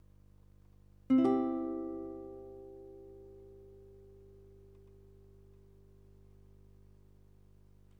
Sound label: guitar